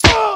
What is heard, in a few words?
man hit